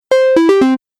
Stage Level Complete; Video Game Sound Effects

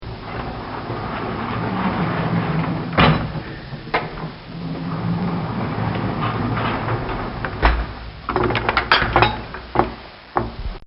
Opening And Closing Stall Door; Doors; Bobby Cole

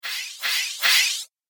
Triple Bouncing Swish; Whoosh Sound Effects; Andrew Fleming

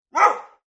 Dog Barks; Dogs; Animals; Partners In Rhyme

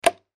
Switch Toggle, Switch Toggle Mechanical; Switches; Foley

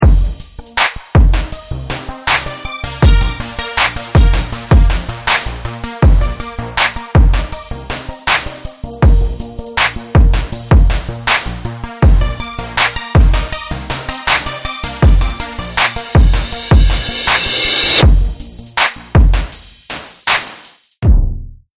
Electric Night Music Ident 80 Bpm; Musical Sound Effects

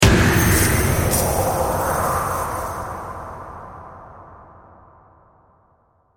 Horror Hit Chains Ghostly Reverb; Production Element; Sound Design

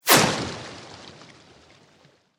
Sweep Organic Fast

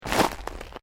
Footsteps Boots Volcanic Rock Step; Footstep Foley; Human

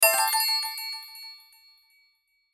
burst bright; Multimedia; Blastwave

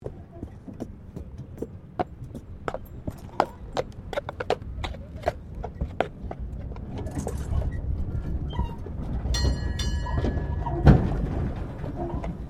Tram Horse Drawn Bell Stop; Horse drawn tramcar; Carriages; Vehicles

